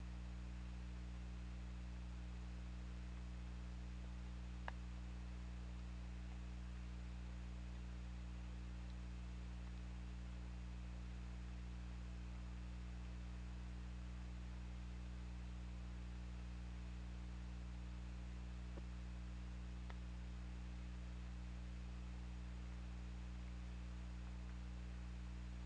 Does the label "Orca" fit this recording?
Yes